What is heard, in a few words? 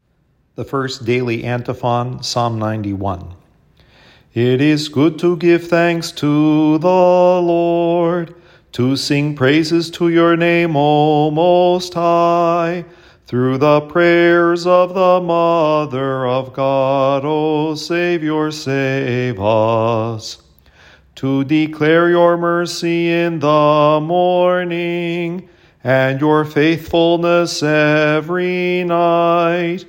daily antiphon